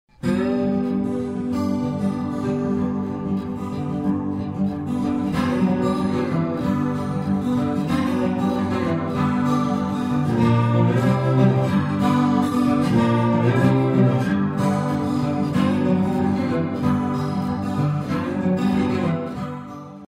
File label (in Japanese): フランジ＆コーラス ちょうどいい感じに